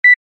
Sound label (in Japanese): ピッ 電話に出る